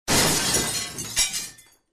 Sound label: Metal hit with a glass shatter